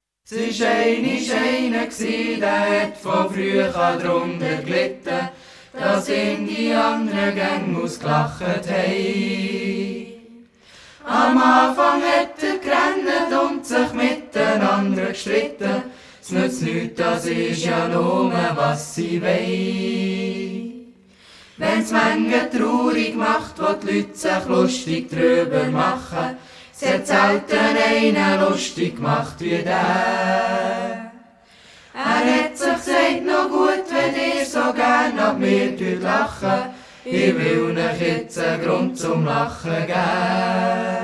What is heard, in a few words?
A Cappella, Ballade